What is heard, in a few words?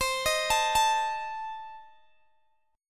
C Minor 6th Guitar Open G, chord